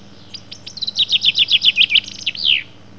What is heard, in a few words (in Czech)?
penkava obecna